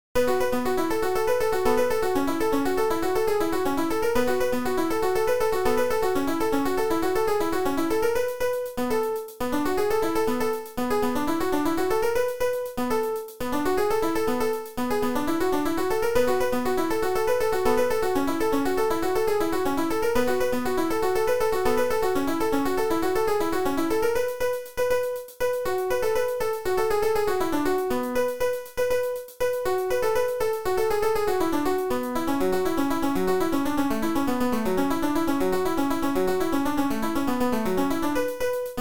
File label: battle music